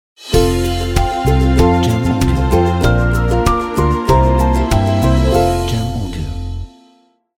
Romantic Happy Ident Logo

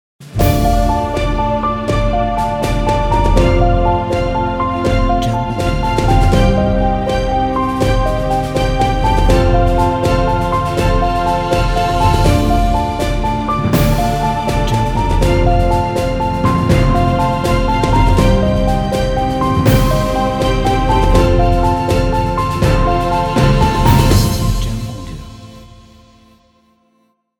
Emotional Cinematic Intro

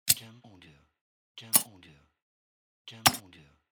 Office Hit Noise

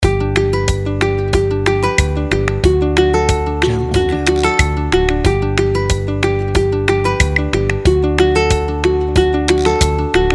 Acoustic Positive Loop

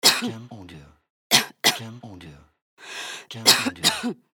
Woman Coughing

